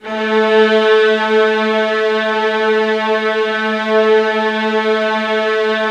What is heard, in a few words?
VIOLINS, Synclavier Strings; ILIO